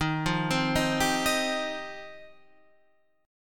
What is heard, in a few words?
F 7th Suspended 2nd